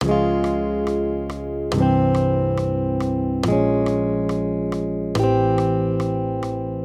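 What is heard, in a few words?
Accompaniment, Major Triads, Db-C, 140 BPM, guitar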